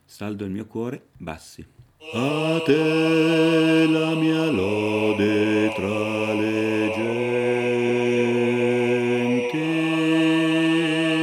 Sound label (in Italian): Bassi, Coro